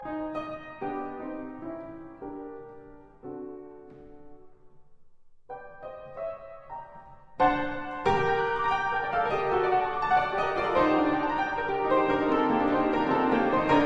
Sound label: Keyboard Grande Sonata in G minor, Allegro appassionato; Op 39